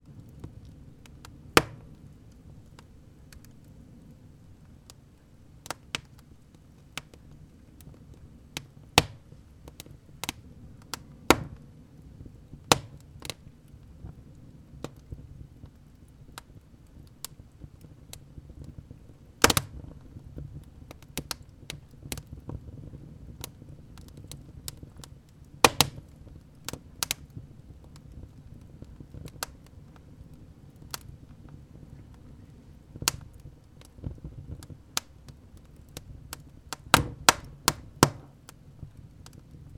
nature fire flames wood burning in woodburning stove